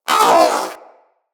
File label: Vocal Pain, Pets; Zanuka; Zanuka Companion A